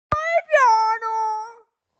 piano

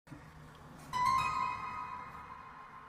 horror realization

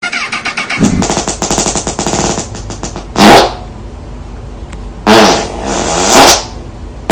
poop machine gun

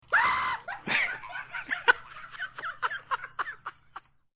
aaaaaahahauahuahu

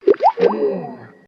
skype call failed

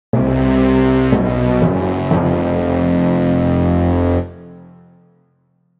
loud dramatic stinger

